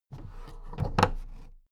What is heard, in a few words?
big plastic case put down